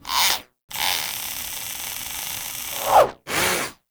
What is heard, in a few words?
Mods Working; Warframe; Hub; Liset Machines; Tenno Mods